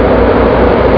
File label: Dv12 run; finnish